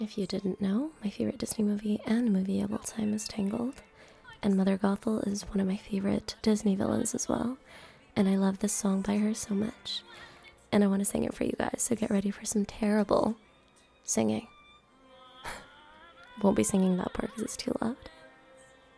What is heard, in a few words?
singing; Tangled; Mother Gothel